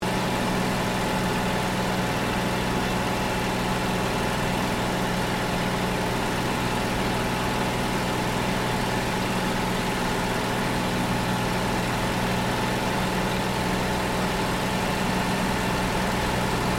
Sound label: AIRCRAFT P-40 KITTYHAWK FLYING INTERIOR STEREO; P-40 Kittyhawk / Warhawk / Tomahawk; Airplanes (Sound Effects:Vehicles)